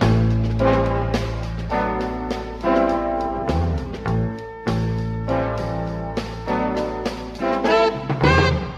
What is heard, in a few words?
horns, Loops